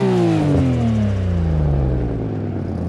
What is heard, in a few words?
engine off, vehicles; crskautos